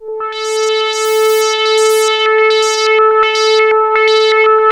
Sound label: ARP 2600, Vintage Keyboards; Big Fish Audio; First Call Vintage Keyboards